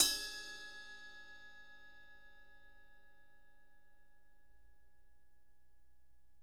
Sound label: RIDE BELL; Sonic Reality